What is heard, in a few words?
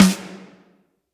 Drum; osu